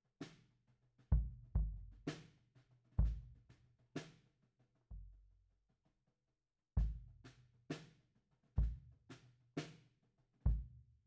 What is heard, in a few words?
Kick Out, 96bpm